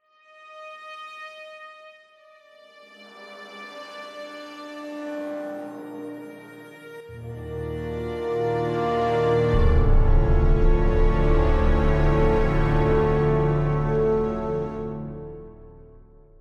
Level Start Sting; Dead to Rights: Retribution

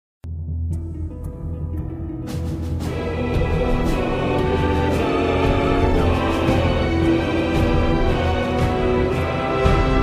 Death; Star Trek Klingon Academy; windows

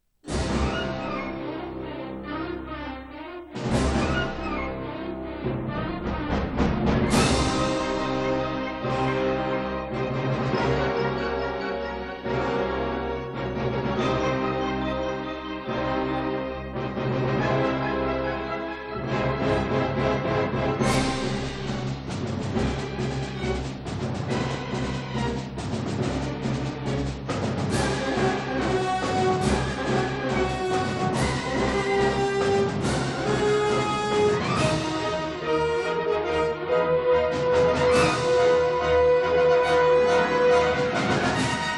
Trailer Music; fracture game 2008

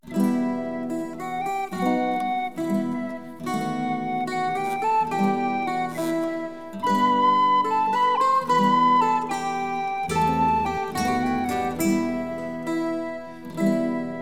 Dublin; anno 1503 gamerip

